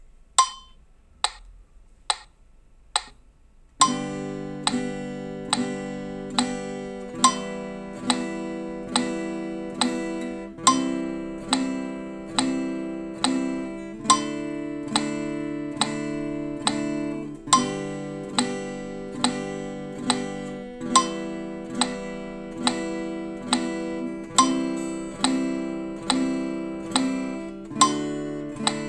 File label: Easy Beginner Chords